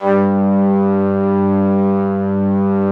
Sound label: ST ORG, STRINGORGAN, Yamaha VP-1